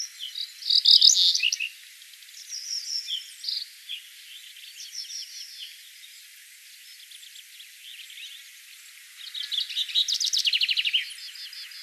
Emberiza cineracea song; passeriformes; emberizidae

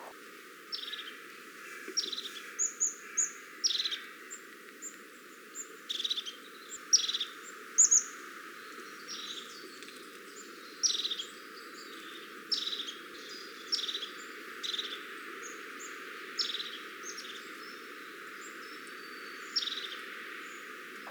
Parus cristatus call; passeriformes; paridae; lophophanes cristatus

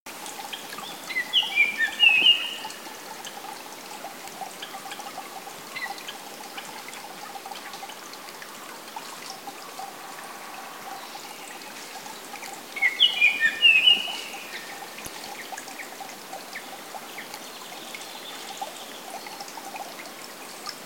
Eophona personata call; passeriformes; fringillidae

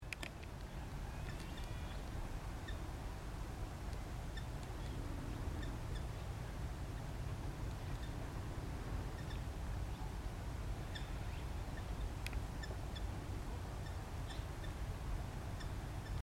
Eophona migratoria call; passeriformes; fringillidae

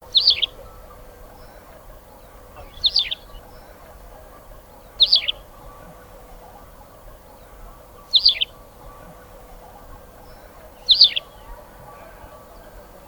Anthus campestris song; passeriformes; motacillidae; birds of the ussr

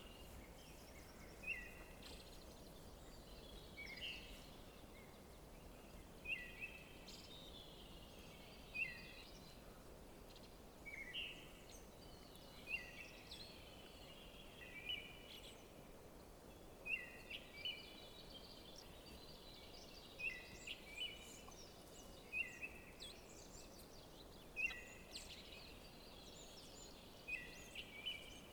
Turdus obscurus song; passeriformes; turdidae; birds of the ussr

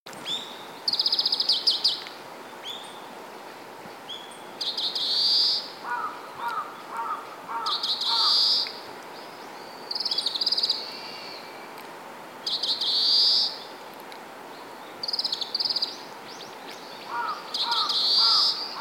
Chloris sinica song; passeriformes; fringillidae; birds of the ussr

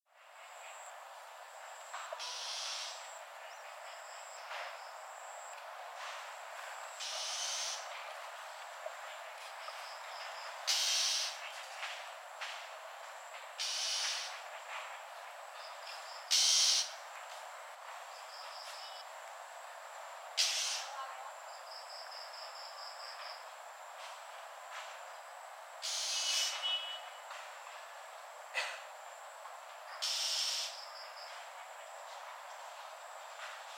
Lanius tigrinus call; passeriformes; laniidae; birds of the ussr

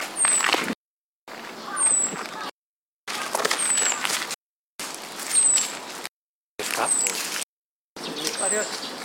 Muscicapa griseisticta call; passeriformes; muscicapidae

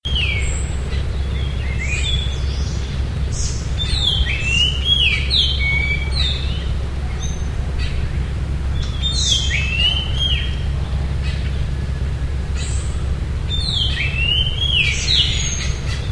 Eophona migratoria song; passeriformes; fringillidae

